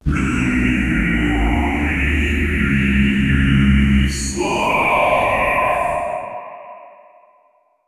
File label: powerful fox god, mythological